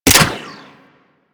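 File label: machine gun dry fire; Weapons; Warframe; Archwing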